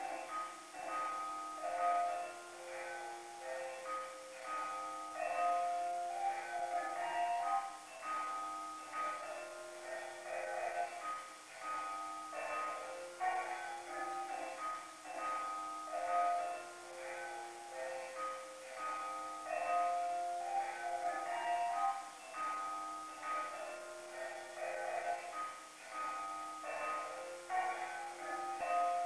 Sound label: Ambience Hats; bratz girlz really rock; ps2 wii; Stylesville